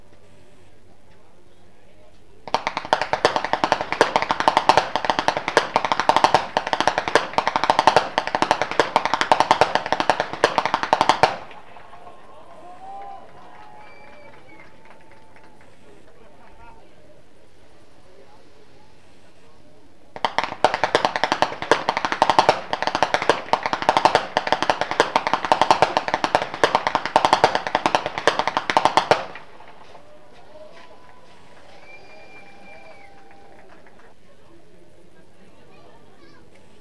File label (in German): schnalzen, flachgau; bergheim